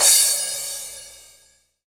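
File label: CYM CRA, crash cymbal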